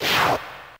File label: Attack; RedDot